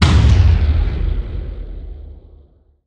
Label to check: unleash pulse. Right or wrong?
Right